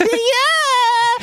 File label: Eyeaaaah